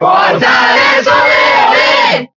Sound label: Cheer Italian; Ness; SSBB